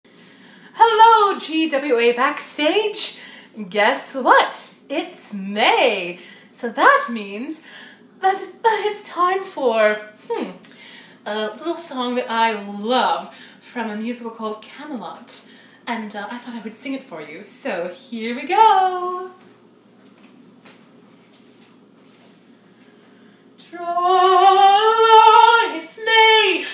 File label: [F] singing acapella; Musical Theatre; Happy Month of May!